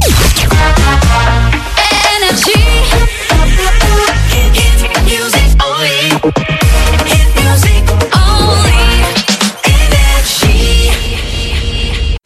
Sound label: Jingles; NRJ Shotgun ID (2025)